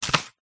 crunchy vegetable single chop with knife; food

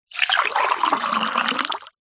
food drink water pour into glass fast

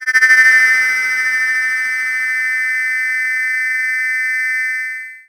sound design sci fi digital burst

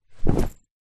foley coat jacket thick drop floor; zapsplat

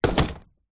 foley suitcase empty set down wood wheels

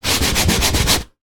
hand rubs interior plasterboard wall; foley

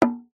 instrument drum small gamelan hit stick single

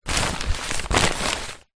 foley paper pile loose movement

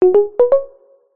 notification tone soft subtle

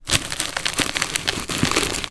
plastic object roll scrape over gritty surface; foley; zapsplat

